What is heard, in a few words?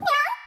select difficulty; Osu!